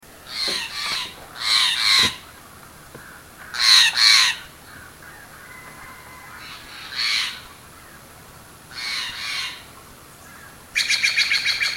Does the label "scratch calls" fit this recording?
Yes